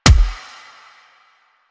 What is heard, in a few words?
Gunbrella Punch, Weapons; Warframe; Tenno; Koumei